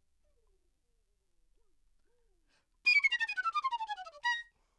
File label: Flute